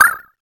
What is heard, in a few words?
Menu Button